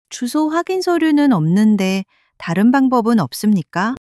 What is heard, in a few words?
struggle